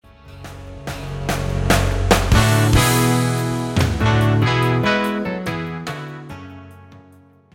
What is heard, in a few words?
cymbals